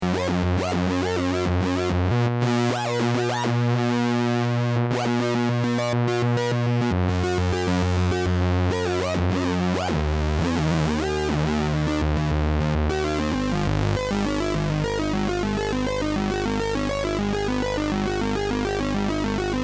Sync Duophon Square

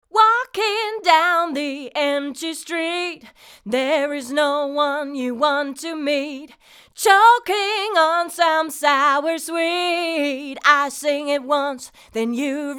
TritonAudio D2O Mono Vocal Tube Fet HI

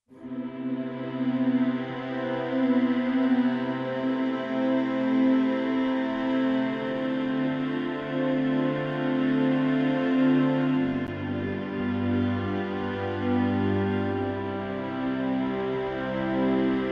string ensemble longs; NI arkhis

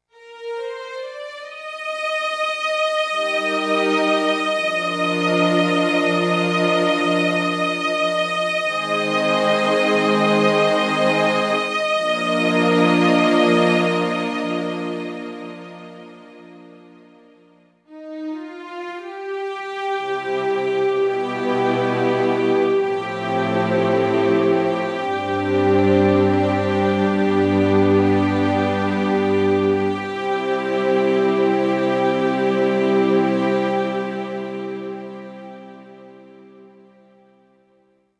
Yamaha P 515 Slow Strings

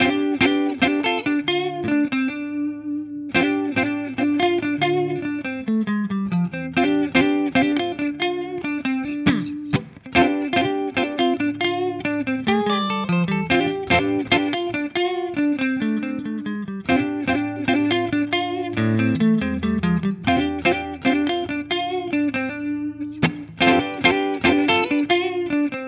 Harley Benton both clean

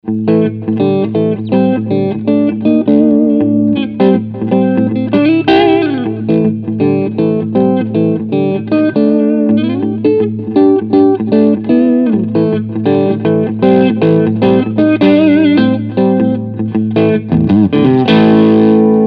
Front HB Crunch

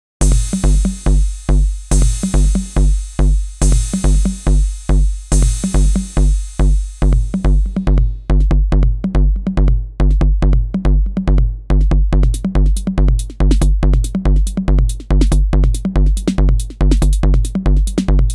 TR-606

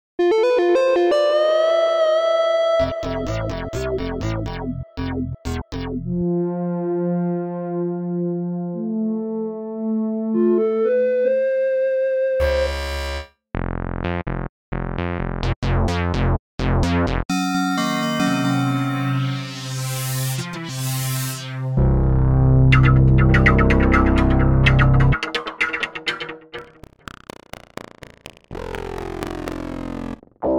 Prologue Presets Sounds

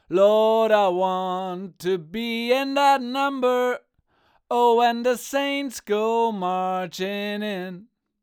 AKG 414XLS Singing Cardioid

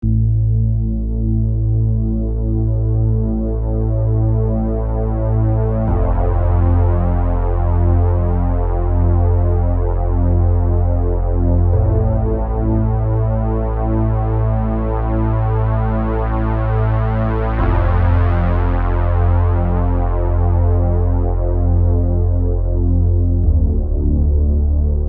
Moog dry; MoogM15

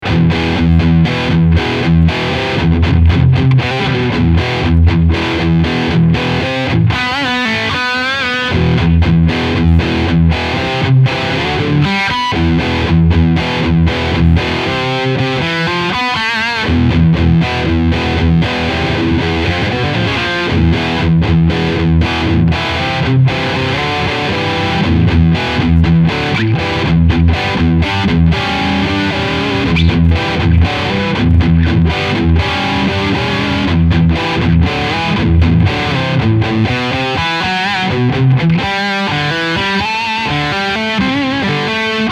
OD Sound I

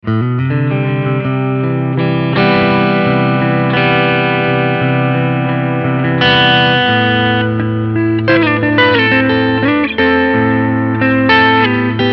Front HB Clean Picking

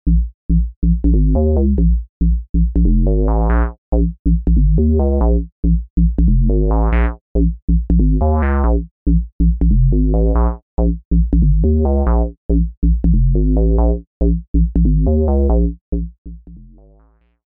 Bass

